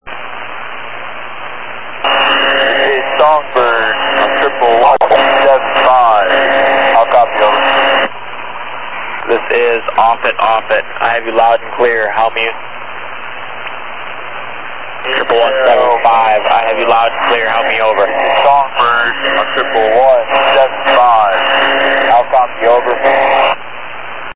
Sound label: song brook